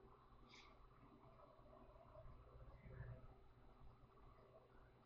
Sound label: 2nd floor open space EVP; caliente pizza draft house crafton investigation